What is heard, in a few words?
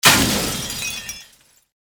crash and glass break